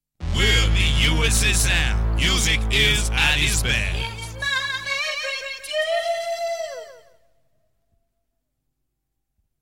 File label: Jingle